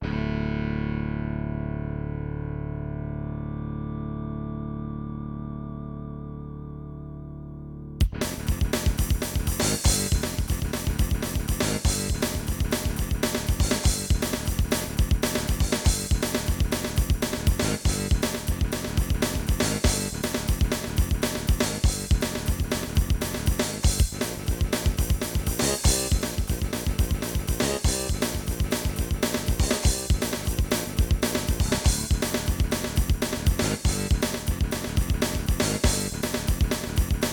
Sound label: ensoniq elite; Ensoniq Sounscape Elite; soundcard test; Doom